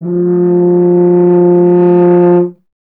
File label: BRS TUBA, Solo Instruments